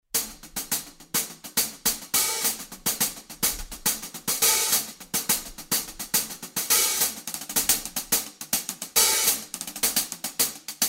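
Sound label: Open Closed Pattern, hi hats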